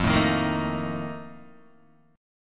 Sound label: F 11th, Guitar, DADGAD